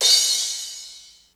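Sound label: crash; xr10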